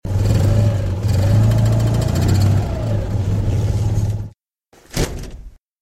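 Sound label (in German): 2CV kommt Autotür; Autotuer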